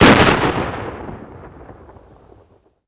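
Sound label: Gun Shot Single Shot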